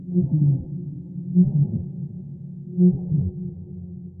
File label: Ice Whale death; Barotraumatic